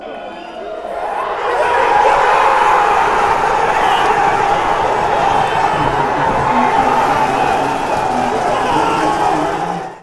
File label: score goal, fanchants